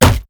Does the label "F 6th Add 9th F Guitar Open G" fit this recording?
No